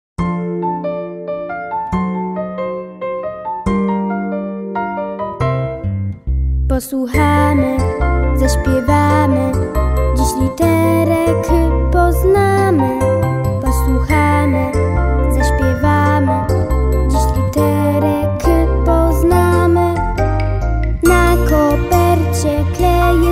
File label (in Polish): piosenka litera k; szkola marzen na tak; klasa 1